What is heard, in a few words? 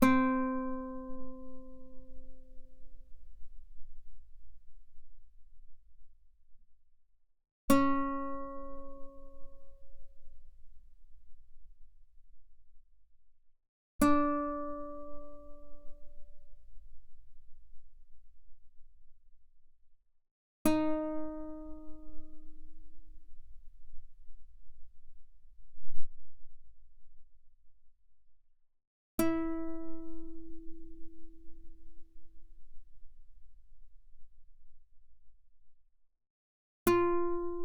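Guitar fortissimo sul B C4 B4; mono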